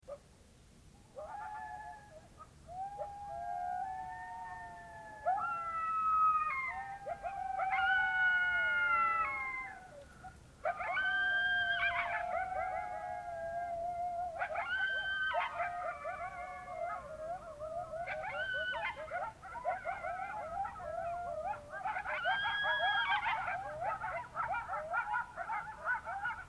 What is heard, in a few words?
coyotes; usary park az